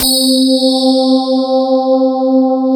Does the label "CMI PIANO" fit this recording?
Yes